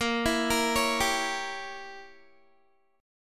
A sharp Diminished 7th, down, Guitar; arpeggiated; tuning 4E,4A,5D,5G,5C,6F; chordshape

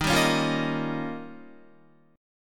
D sharp 9th, Guitar; strummed; 10,10,13,10,10,11; 4F,4A,5C,5G,5C,6E; JGuitar

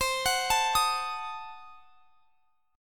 C Diminished 7th; arpeggiated; Cdim7; x,x,22,23,22,23

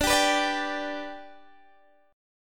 B-Minor Sharp 5th

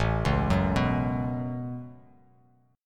A 6th Add 9th A down, chord; A6add9; arpeggiated

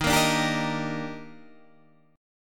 D sharp Major 7th, Guitar Half Step Down

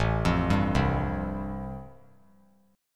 A-Minor 7th Sharp 5th, chord; Am7#5 arpeggiated; JGuitar

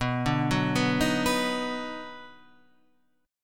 D sharp Minor Major 7th Sharp 5th B, chord

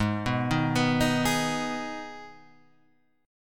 G sharp Minor G sharp, chord; G#m Chord {4 2 1 4 4 4} arpeggiated

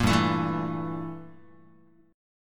G Minor 6th Add 9th, Guitar; strummed; 1,3,0,2,1,x; 4A,4E,5A,5D,5G#,6C#; JGuitar

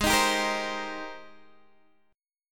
Ab Major 9th Ab Guitar DADGAD

